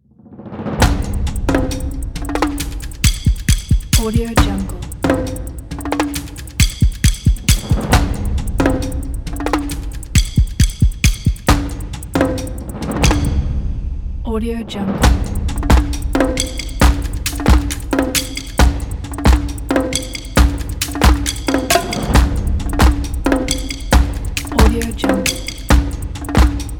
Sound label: percussions